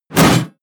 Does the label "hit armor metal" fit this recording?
Yes